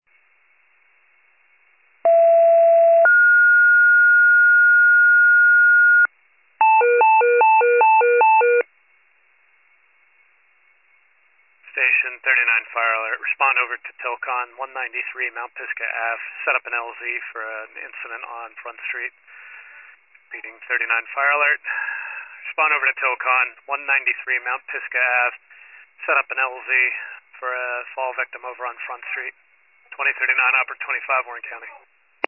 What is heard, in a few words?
FIRE pager alert